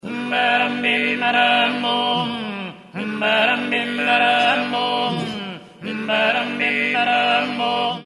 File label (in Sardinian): oche bassu contra